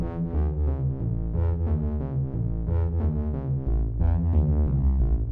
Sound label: Bassline Sample